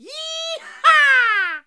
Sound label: YEEHAW, YELL